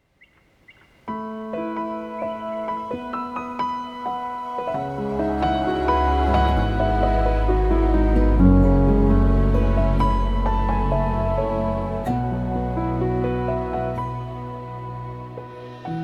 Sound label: Petrof Grand Piano; Contextual Demo; Bastien Rousset